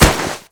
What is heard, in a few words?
bodyfall dirt sand